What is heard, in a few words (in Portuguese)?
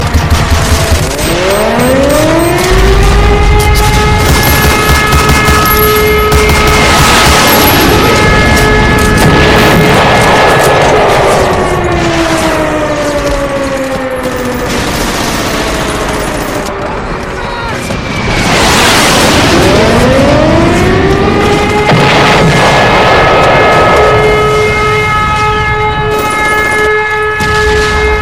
alerta de guerra tiroteio